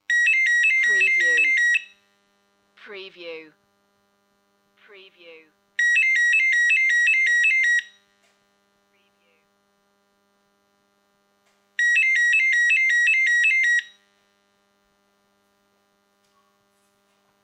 digital telephone type

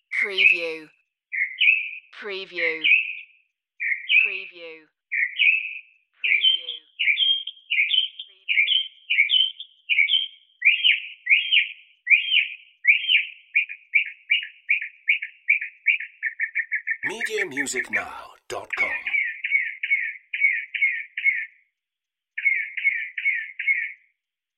Mocking Bird Multi

